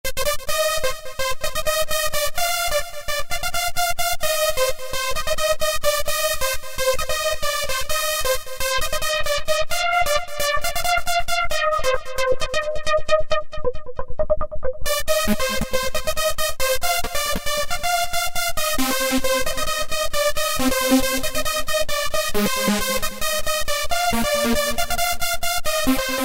Unison Saw Lead, Korg microKORG; Factory Voices

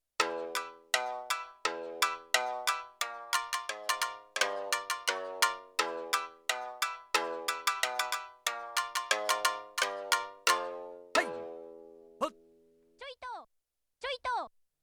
Short String, Shamisen; Yamaha TX16W

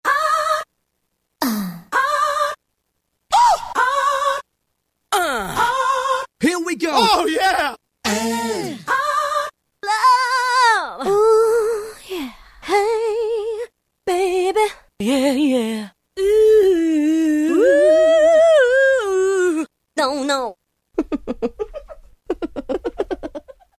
Vox Menu; Roland M-DC1

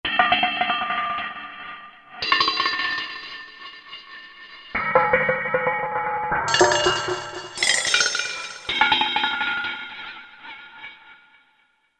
Coin Spin; Korg MSC-14S Sound Effects

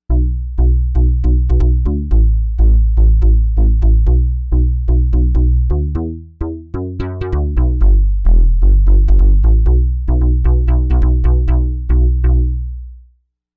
MK-80 Bass, Novation UltraNova; SuperNova Soundpack Volume 1

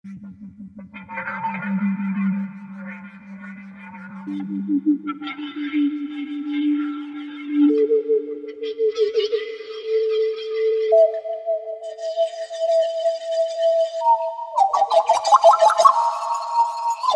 Sweep, Korg MSC-14S Sound Effects

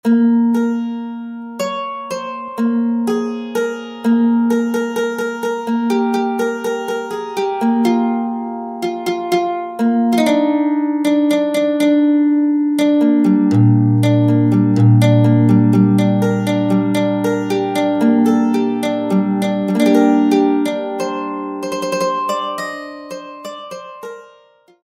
MANDOLIN; Sonic Reality American Heartland

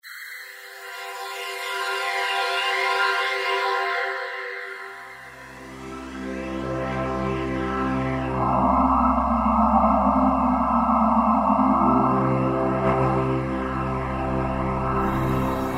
vox, E-mu PK-6; Vapor Vox

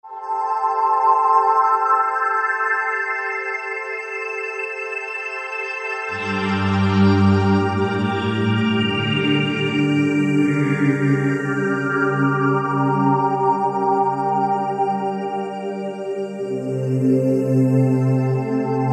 Cosmopolitan, House Collection; Roland SR-JV80-19 House Collection

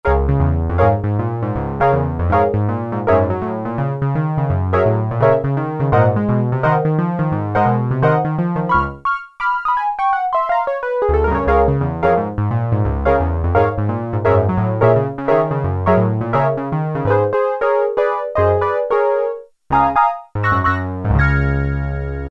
Bright Piano, Korg Poly-61M

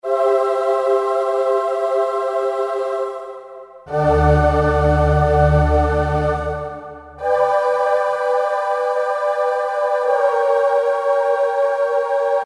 Acappella, Korg N364; Factory Combi demos

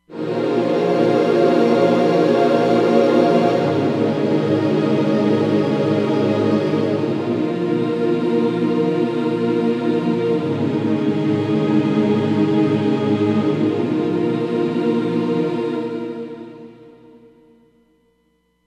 Uhmmm, Roland Fantom XR

